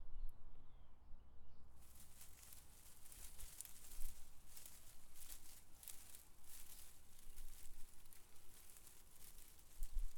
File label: Ruffle